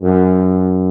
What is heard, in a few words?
Orchestral Brass